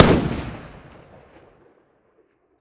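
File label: weapon ar fire player atmo exterior; gmod; viper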